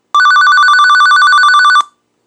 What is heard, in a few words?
Loud Ring